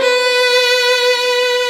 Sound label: violin; osu